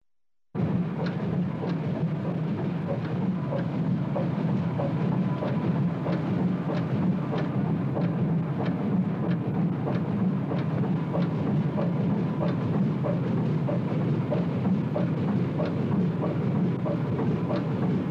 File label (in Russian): motornij otsek na korable